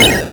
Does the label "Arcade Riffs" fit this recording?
Yes